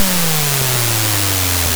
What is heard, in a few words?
YM Noise; NOISES; ChipShop Deluxe; ChipShop Hits